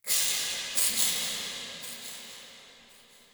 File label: Interior Texture High Pitch Screech, Infested, Ambience; Misc Indoor